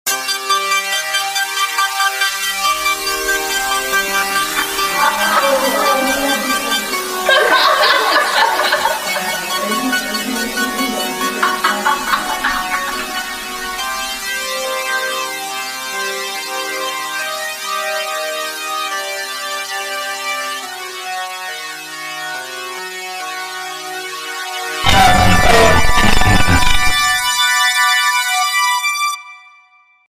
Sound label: jingle